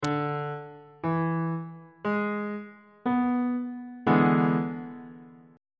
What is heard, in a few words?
f flat dominant 7th 3rd inversion on bass clef